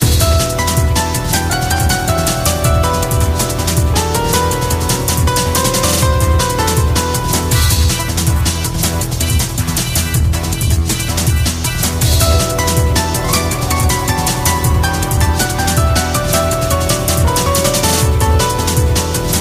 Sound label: ringtone